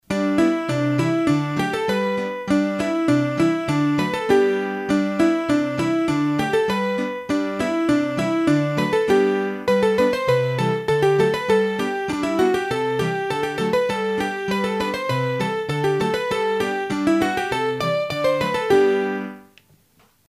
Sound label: D'ou Vien-tu, trad Auvergne, hurdy-gurdy, Scottishes